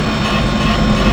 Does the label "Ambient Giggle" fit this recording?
No